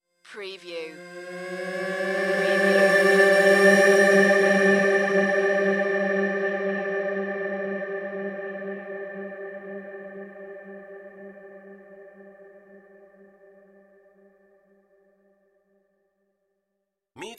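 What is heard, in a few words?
Production Element Synth Bend; Sound Effects Bible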